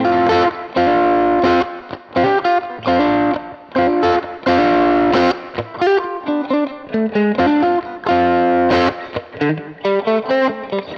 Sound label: Nux MG-30 Vibro King